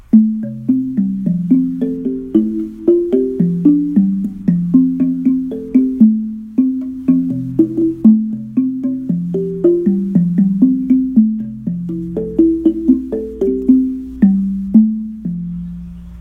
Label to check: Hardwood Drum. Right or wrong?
Right